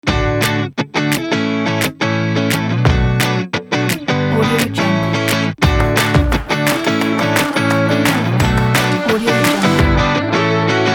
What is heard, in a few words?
happy pop rock